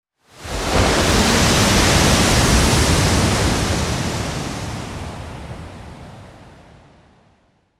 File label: huge wave crashes and passes; نزدیک شدن و رد شدن موج; nature sounds